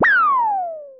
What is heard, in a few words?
zap, Roland MC-909